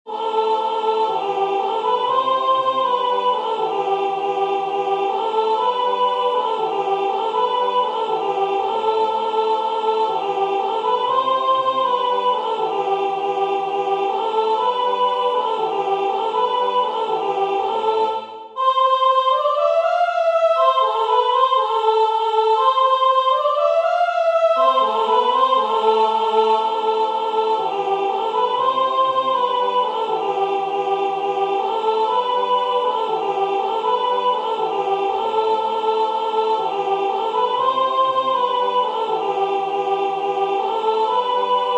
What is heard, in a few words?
Gaudete Christus est natus soprane